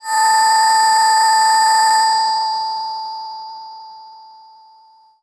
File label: Breath V DX100 A4